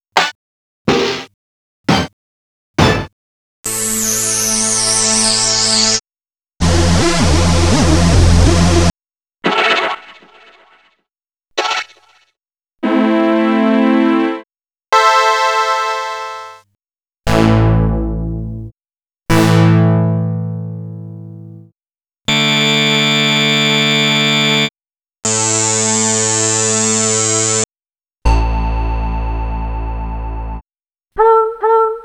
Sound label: Rave Hits; X-Static Goldmine; ravers (chains)